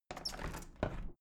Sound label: window plastic squeak open heavy sequence